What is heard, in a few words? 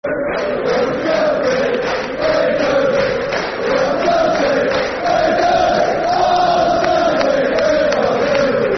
Football Chant (Soccer Songs); Celtic; fanchants-com